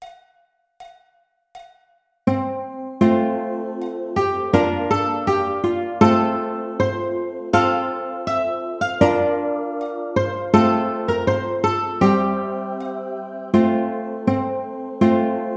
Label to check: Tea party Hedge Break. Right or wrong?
Wrong